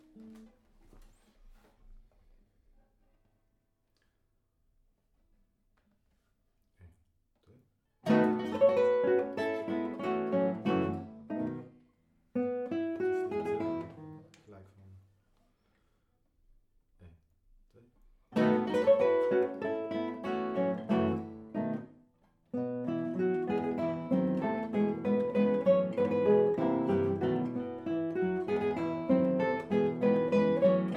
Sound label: guitar